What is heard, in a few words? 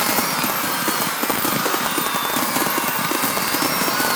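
big win ambience; vegas night life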